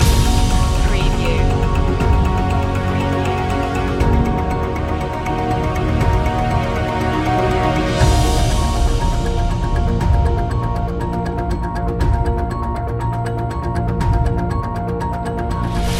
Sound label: villain loop